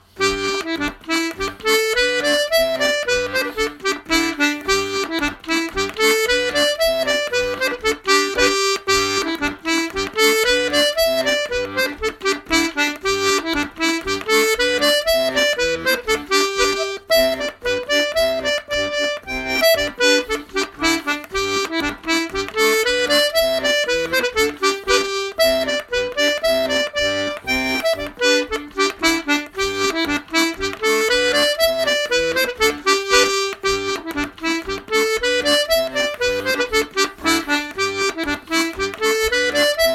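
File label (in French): polka, accordéon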